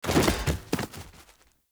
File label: Battle Dog Bodyfall Dirt, Foley